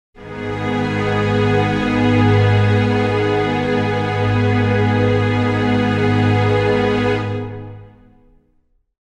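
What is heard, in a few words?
string chord g sharp minor, Pads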